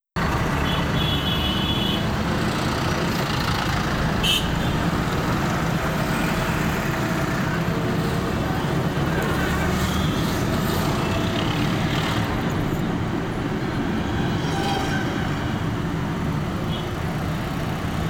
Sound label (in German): Fahrt, fieldrecording, Boudha, Kathmandu, Nepal; Original Video Ton